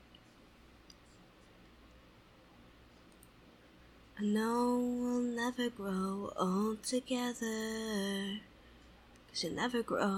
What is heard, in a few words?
Song Cover